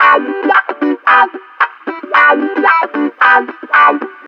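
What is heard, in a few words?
guitar 1, 112 bpm, Funk; USB Soundscan vol.41; AKAI; Roots Funk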